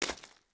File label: FS Mud, footsteps